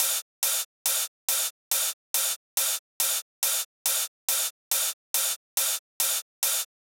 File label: drum Loop 140BPM Ride; Multi Loops